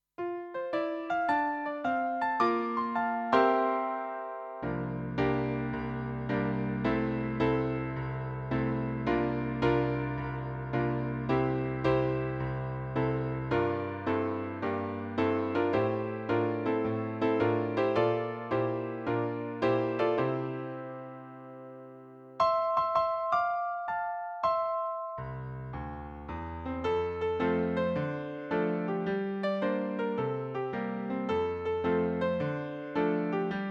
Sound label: New Years Resolutions Backing